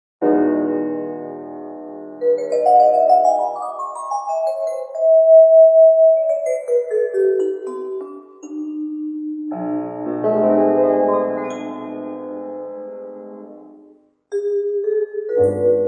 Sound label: Jazz Quartet; Astoria